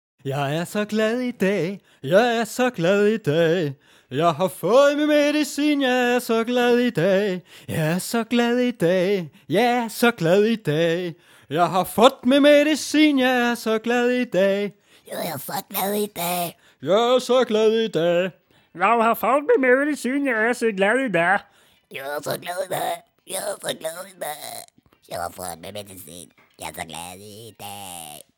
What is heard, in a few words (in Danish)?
glad vokal